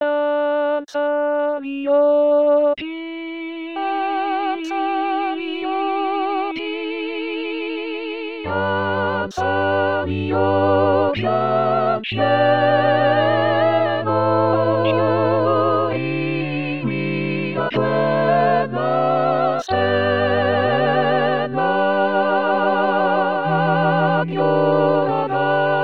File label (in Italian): tutti